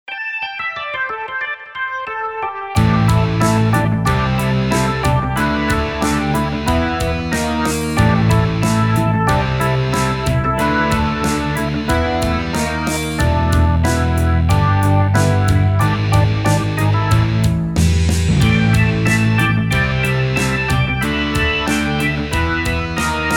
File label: Rockstar instrumental